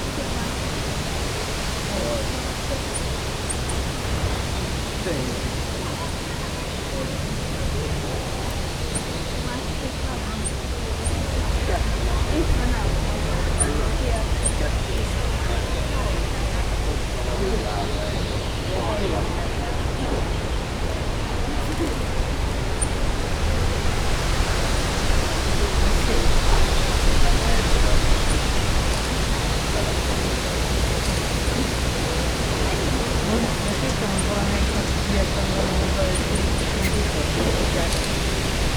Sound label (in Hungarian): kicsit gyakrabban erosszel, ormanyos medve; Győri Zoo 2014